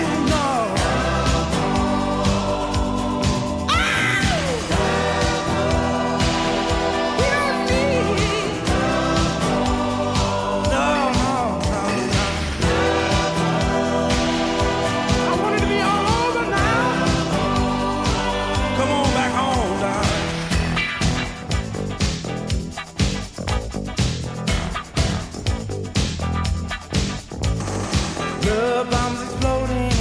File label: 12 Inch Dance 80s Groove